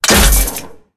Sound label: Character Body Impacts